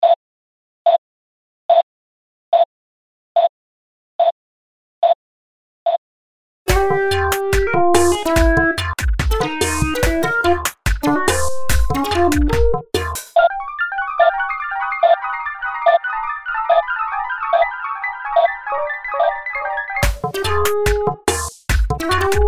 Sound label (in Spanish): electromix pieza